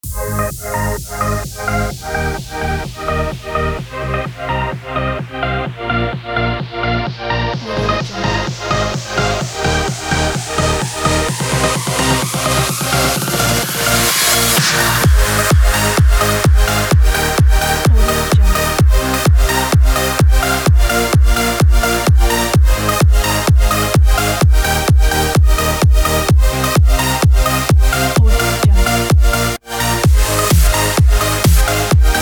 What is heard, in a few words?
euro dance